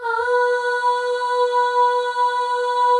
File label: AHS, Symphony of Voices, FEM